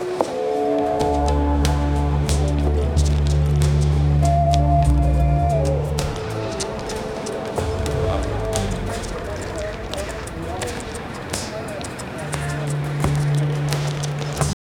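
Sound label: backstage R&B showcase ambience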